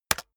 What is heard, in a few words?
keyboard external space hit very fast